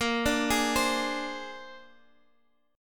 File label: Bb 9th Bb down Guitar Half Step Down